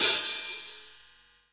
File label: HH, Drum Dry; East Collexion; Drum 1 Dry